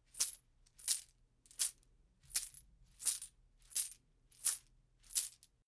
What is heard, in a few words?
chain mail armor movement sequence